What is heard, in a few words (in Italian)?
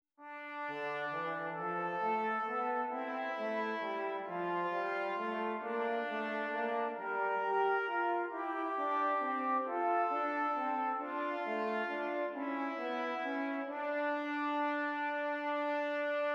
Esercizi di contrappunto a due voci; Zwete Gattung; Ronge pagina 65